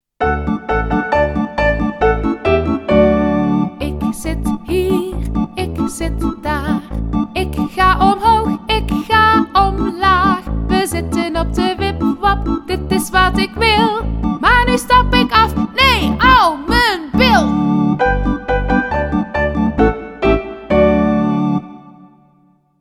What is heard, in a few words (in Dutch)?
wipwap met zang